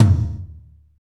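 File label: tom, medium techno, Roland; Northstar - Drumscapes Roland; Med. Tekno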